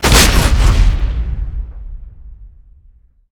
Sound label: euclid finder fire